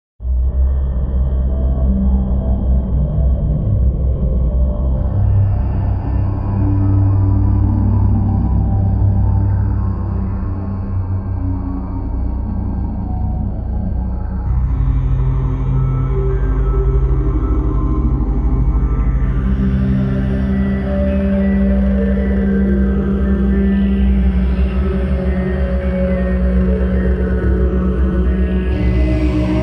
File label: mystic howling drone